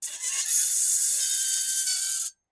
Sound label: Dry Ice Creak Squeak; Creaks n Squeaks; soundbits